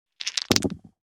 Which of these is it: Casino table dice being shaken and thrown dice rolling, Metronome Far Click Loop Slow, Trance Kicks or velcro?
Casino table dice being shaken and thrown dice rolling